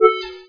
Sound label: signal; netstumbler